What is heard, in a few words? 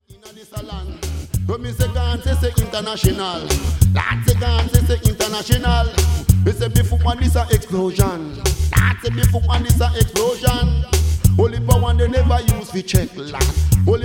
Reggae Explosion